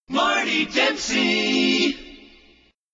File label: jingles logoset accapella; priority one